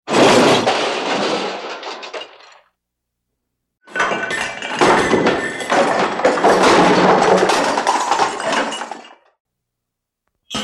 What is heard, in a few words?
household garbage can containing dishes shuffled around; sound effects one